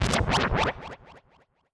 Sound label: poison magic